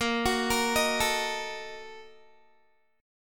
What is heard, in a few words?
Bb Major 7th Suspended 4th Sharp 5th, Guitar; arpeggiated